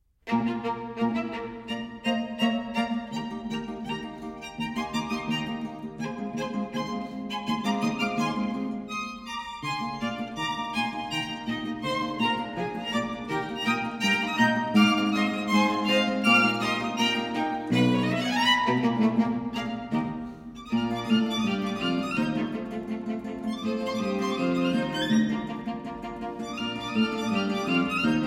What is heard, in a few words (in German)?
Galopp, Schrammeln; Philipp Fahrbach (arr. Johann Schrammel); Fluide-Galopp; Wiener Künstler